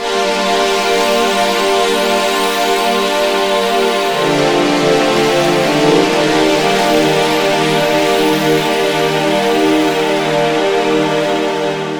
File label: pads C; Zero-G; Total Drum Bass